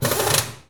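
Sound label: Impact small, air tools, industrial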